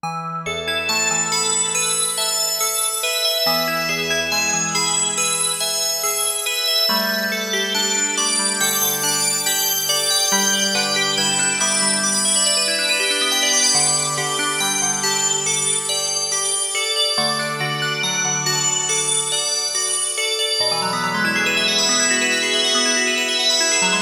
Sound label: reso bell